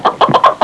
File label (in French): poule cri, cris d animaux